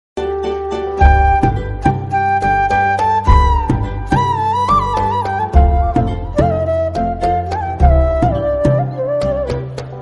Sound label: BGM ringtone